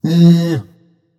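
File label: tongue, non-verbal; smite; voicepack; merlin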